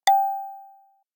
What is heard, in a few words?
chat notification